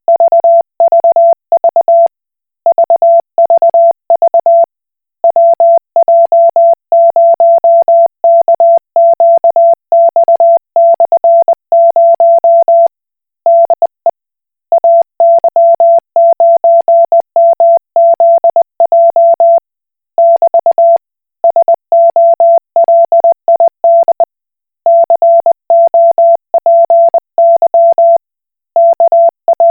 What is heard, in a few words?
Koch Morse